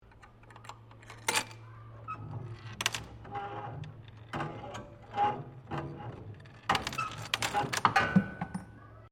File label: Slot Machine Dive Bomber Coin Maze Operating, old coin slot machines; Various old coin slot machines